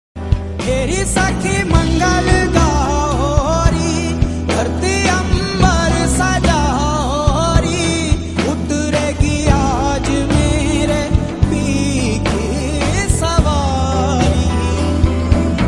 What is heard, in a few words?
mahadev bhakti song ringtone